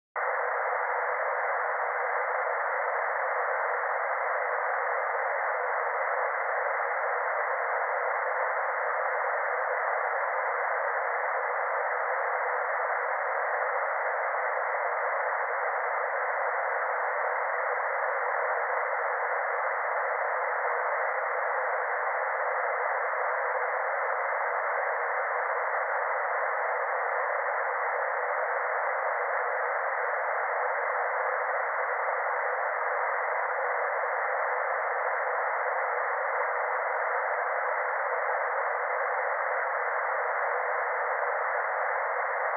Reference Tones Left-channel match tone; Surround Spectacular

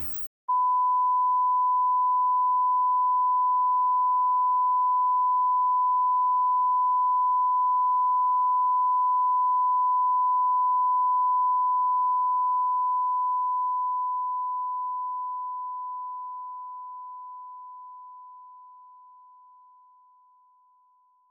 Home Theater Reference & Demagnetizing Tone; Stereophile Test CD, Vol 3

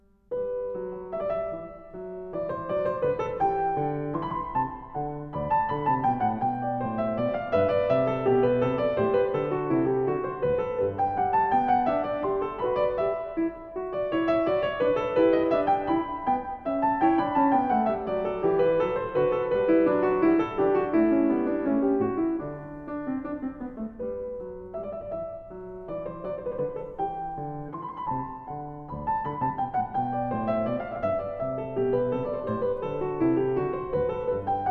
Variation; Bach; Goldberg Variations, BWV 988

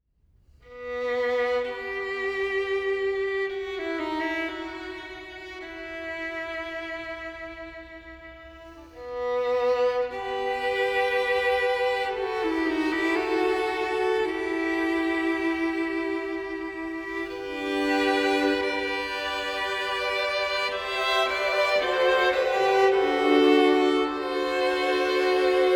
Trio

